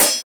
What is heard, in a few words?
Hihat; Ghosthack Advent Calendar Day 4